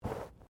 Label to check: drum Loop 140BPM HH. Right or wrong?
Wrong